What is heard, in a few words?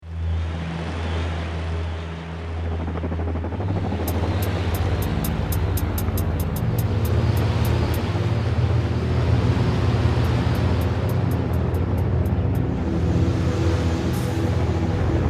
Strange Wind